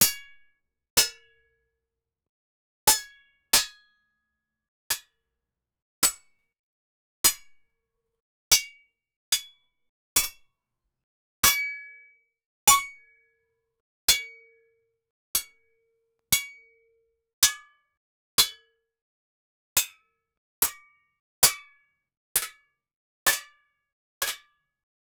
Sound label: hard iron being struck